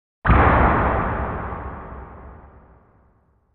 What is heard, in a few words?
Bass Impact Low Drum; impacts